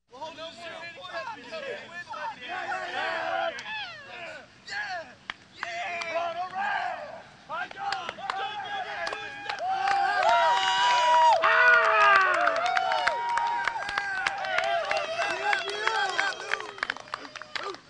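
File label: amateur football fans, foreign recording; Звуки футбола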